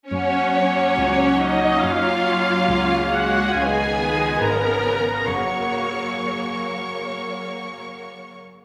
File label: MUSIC; FIAT 500